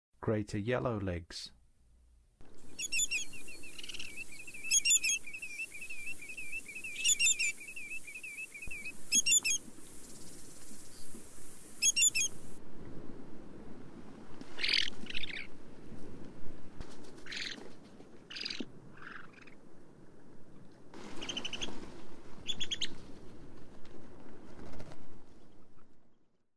Greater Yellowlegs, Songs of Birds of North America; Western USA; Western USA Songbirds